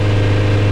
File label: engine; stunt rally